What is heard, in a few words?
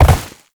Land Snow; Movement; Warframe; Uriel